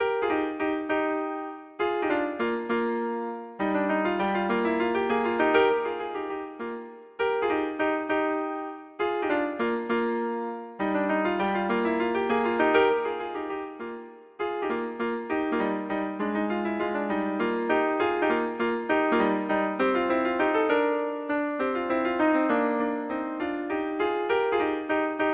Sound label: duos menuett, french horn duet